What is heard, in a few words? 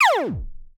synth laser; retro synth